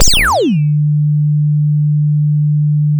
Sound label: sound of a bike bell